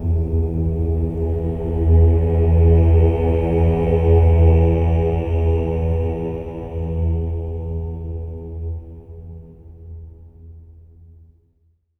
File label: OH E2, Symphony of Voices, SWELL OHS; Spectrasonics